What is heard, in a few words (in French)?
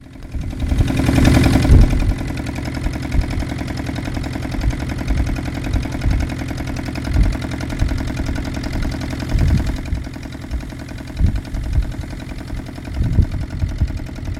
Indonésie le bateau moteur au vieux port Kuta